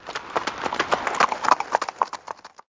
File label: road building sound; catan